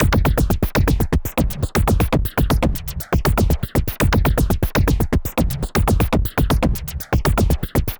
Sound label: Anabeat Mix, Beats